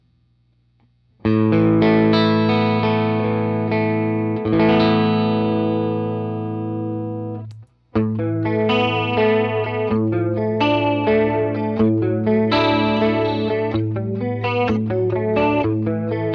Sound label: Tele clean; Goddess